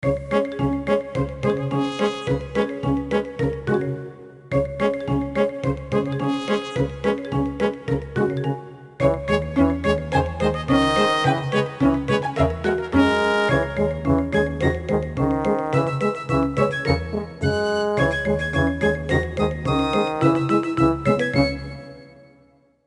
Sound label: Background Music; little big adventure 2 twinsen s odyssey original soundtrack